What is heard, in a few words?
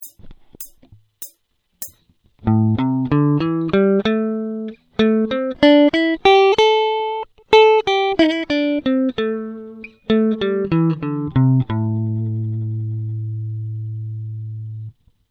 blues